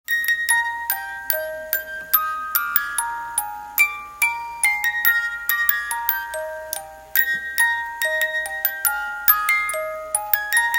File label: Unicorn song, music box; small music box